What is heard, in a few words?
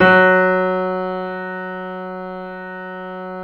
F#, The Piano; InVision Interactive - The Piano